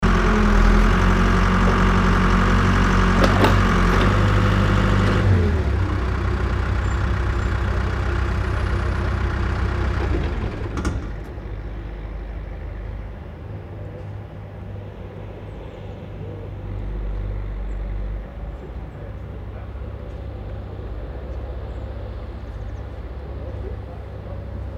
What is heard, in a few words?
digger unloading rubble